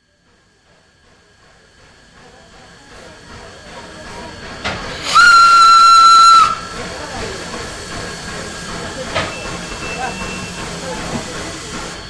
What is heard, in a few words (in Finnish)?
hoyryjuna